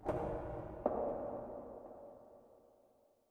metal hit far; soundscapes; occultists

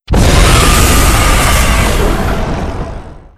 bomb

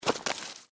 gecko jump; npc

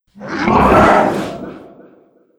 attack

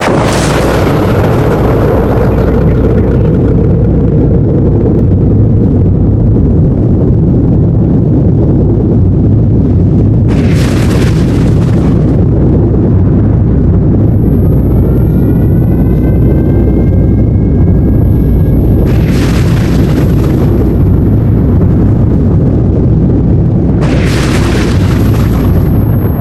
blowout, ambient noises background; paradoxrp

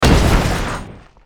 down; darkwraith

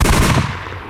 shot

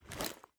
sight raise

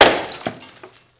ice impact

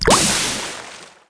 water

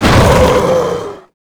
golem hurt

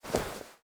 weapon draw

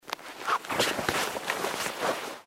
wear

